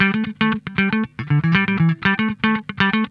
FULL PICK, Funk Guitar; 90 s Sample CDs; Zero G

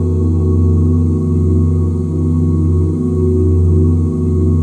OOH F FLAT5, OOH CHORDS, Vocal, Jazz; ILIO; Vocal Planet; Jazz & FX

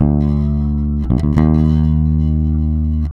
REAL BASS, BASSLICKS; Ueberschall; Jam Box

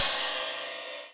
Rides, Cymbals

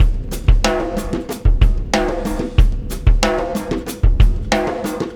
Wormhole Beat, Processed Drumkits

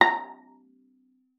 A#5, VIOLA PIZZ; Xsample VOL-5

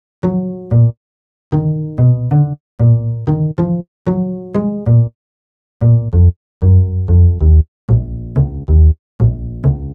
Bass Combo

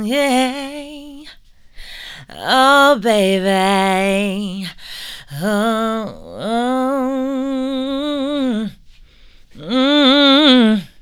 "yeah oh baby", Female Vocals; PlatinumPhattCD2; E-MU Producer Series Vol. 8 – Platinum Phatt

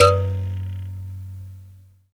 BUZZ, Buzz Kalimba; Roland LCDP11 Africa VOL-1

